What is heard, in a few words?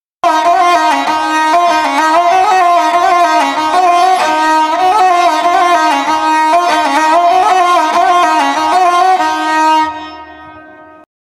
Sarangi 96 BPM